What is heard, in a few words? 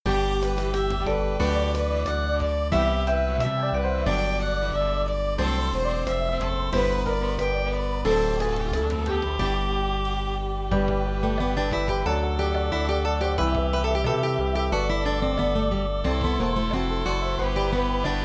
mozart and jazz